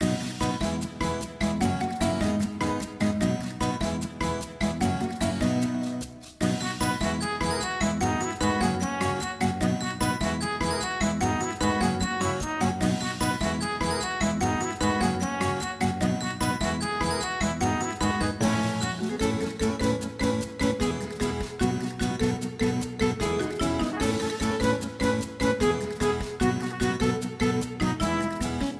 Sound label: music loop; game; dapopinata